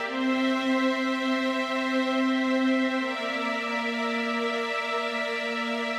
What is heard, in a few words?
70s Ballad Strings